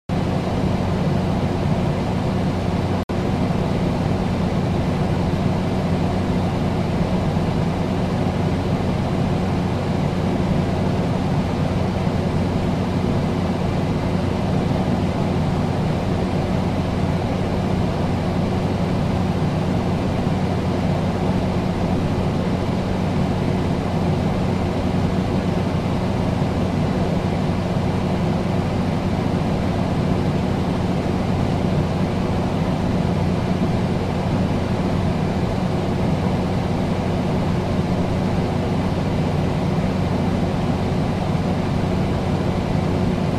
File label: air